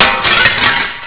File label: glass; duke